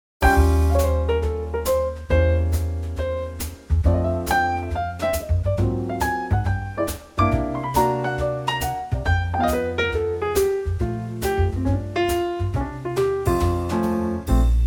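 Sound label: backing track; key - Bb - range - A to C; Swinging Trio arrangement of this classic standard with plenty of room for soloing.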